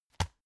tissue package drop

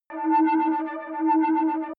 flying alien drone

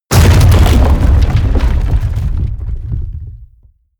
break wood large

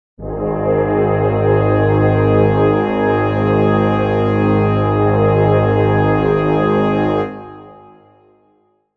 brass chord g sharp major

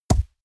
horse step grass

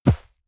horse step snow

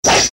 emphased swishes

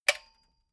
metal button stereo spring click fast

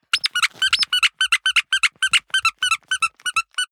squeaker squeeze sequence hard

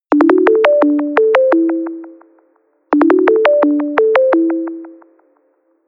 notification call

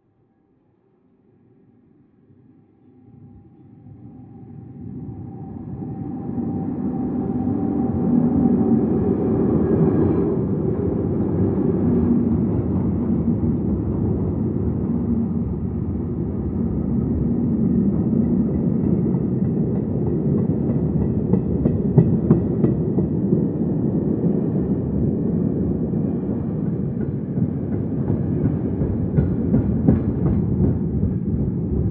train freight drive by distant

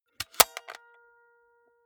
walkman close clink hard

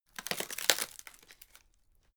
wood crack slow

